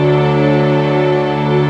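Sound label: Pad D major, Orchestral; Sonic Foundry (Sony Creative Software); Orchestral Series VOL-4; Rock and Pop; Pads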